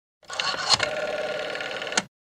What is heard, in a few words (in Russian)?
phone